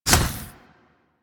Drill Flame Burst; Warframe; Grineer Ghouls; Ghoul Drill Sergeant; Attacks

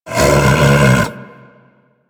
Tame Sleep Idle Snore; Enemies; Warframe

